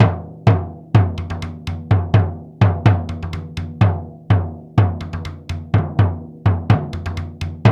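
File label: 125 bpm, daiko ensemble; Spectrasonics - Supreme Beats - World Dance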